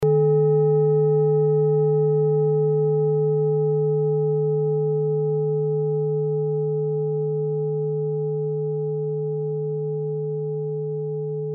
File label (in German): klangschale orissa